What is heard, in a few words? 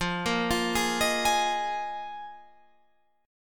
Eb Suspended 2nd Suspended 4th